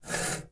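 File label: metal slide small; objects; Star Wars Obi-Wan; XBOX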